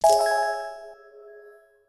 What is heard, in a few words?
Interact Succeed